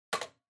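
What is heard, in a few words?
Soroban Put, abacus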